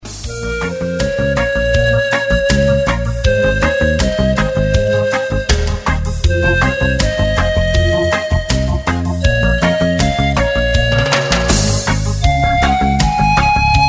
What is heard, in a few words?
Ocarina Reggae